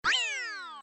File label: falling; Ben 10 - Tomb Of Doom